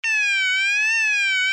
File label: alarm tone, sounder alarm tone; sounder beacon